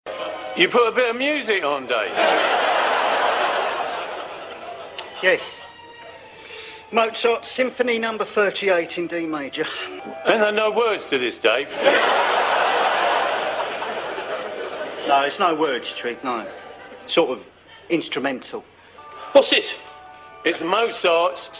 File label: Music